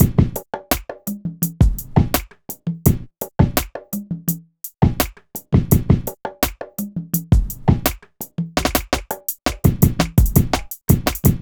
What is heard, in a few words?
Drums, Hip Hop